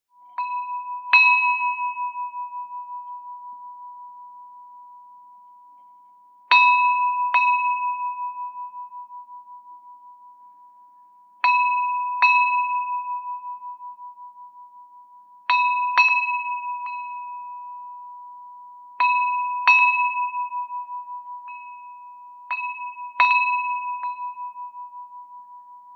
Bell rings, no background. Clean, harbors & marinas buoys